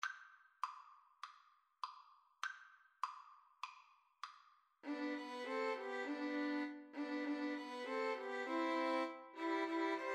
jingle bells 3 VLN

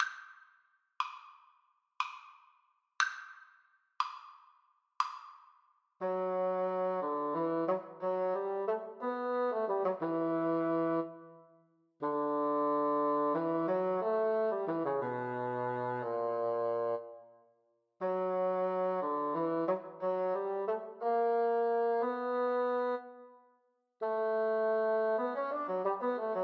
duos adagio, Bassoon Duet

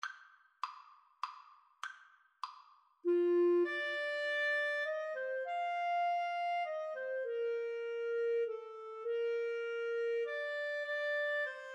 Clarinet French Horn Duet

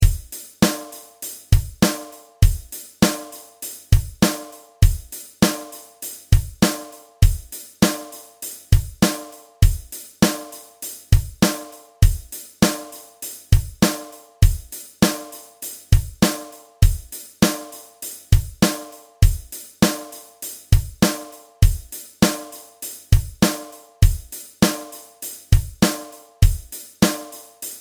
country riff, guitar

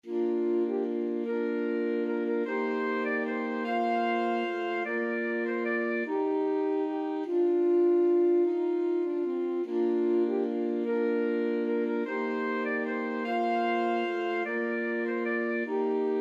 skye boat, alto sax quartet

